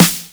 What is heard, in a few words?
normal hit clap